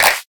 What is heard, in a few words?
dry hand clap sample a key; single hits one shots